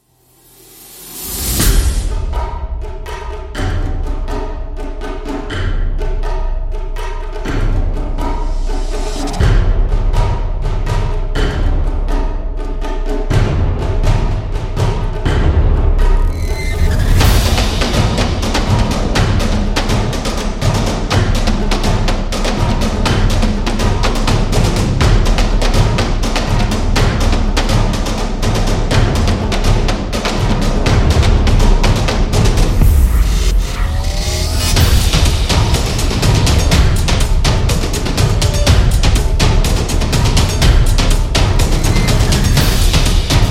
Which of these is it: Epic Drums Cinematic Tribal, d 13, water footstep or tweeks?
Epic Drums Cinematic Tribal